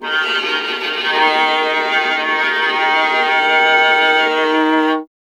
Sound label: viola D#; Miroslav Vitous; Solo Instruments VOL-1